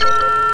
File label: WARNING